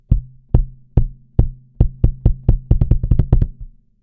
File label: kick; VLA-3A